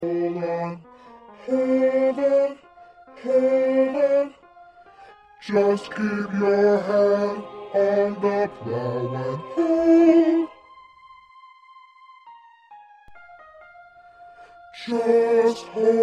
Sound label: 1st Bass, choir parts